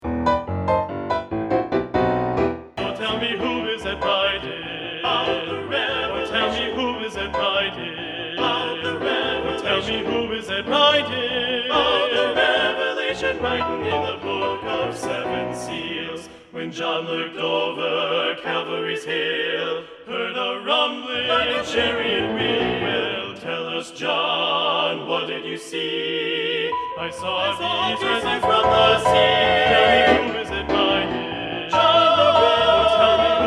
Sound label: Balanced Voices, SATB Honor Choir